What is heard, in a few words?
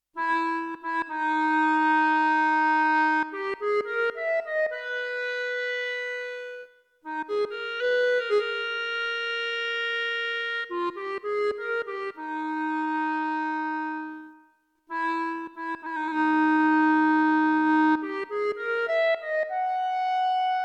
Classical Clarinet, EWI5000